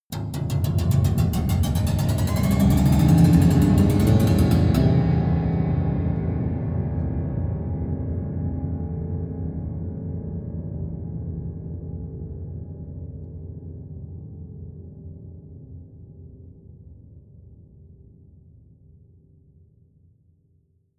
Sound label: piano twang accelerate low